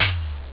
hihat; Amiga Soundtracker Sample Packs

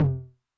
HI TOM, Med Tune Med Decay, 909; classic machines; HT - HI TOM

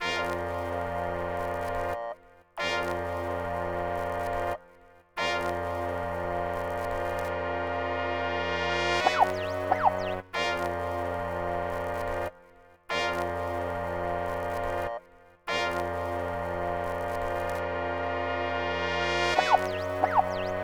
Melody

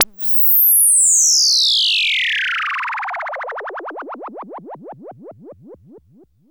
FALL, SYNTH FX; AMG - Global Trance Mission VOL-2

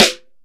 Snare OS; Rondo Brothers Trip-Hop Loops & Samples; Until We All Fall Down

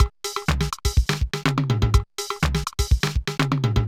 Loop, mxr; classic machines

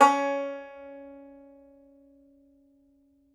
Tenor Banjo

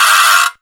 FX, Ragga & Dub Reggae; USB Soundscan vol.27; AKAI

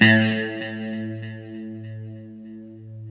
LO E A 2, Guitars; Spectrasonics; Hans Zimmer Guitars VOL-1; JC-SHEEN

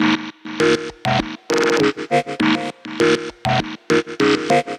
100 Fm Taper, Melodic Loops; M8; Electronisounds

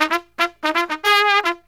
TRUMP, Killer Brass Riffs; USB Soundscan vol.29 - Killer Brass Riffs; AKAI

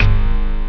RZ BASS 2

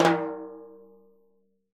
TIMBHI, Synclavier Percussion; ILIO; Synclavier Percussion 2; World & Orchestral

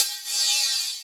Open Hhat, Hihat; Equipped Music; Loungin House

